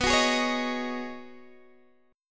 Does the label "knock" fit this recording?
No